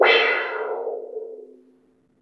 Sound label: crash L; Zero-G; Chemical Beats; CRASH ZOO